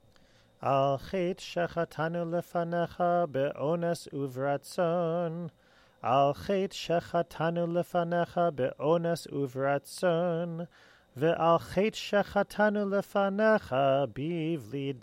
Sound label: al chet tiku shofar